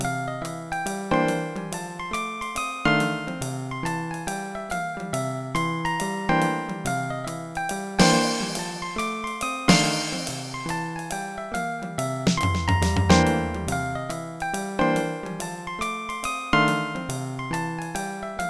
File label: solo; Dr. T's MIDI Recording Studio